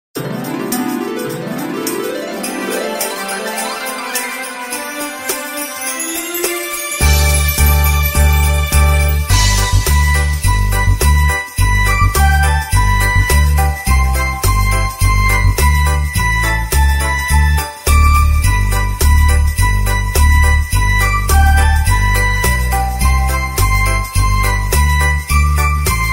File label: Jingle Bells Instrumental